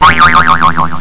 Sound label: boing